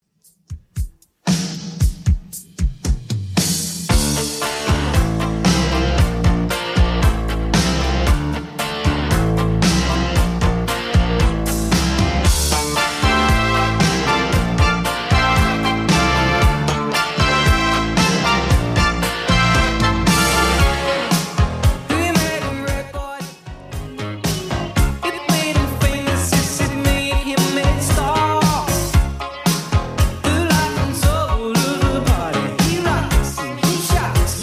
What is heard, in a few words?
80s 115bpm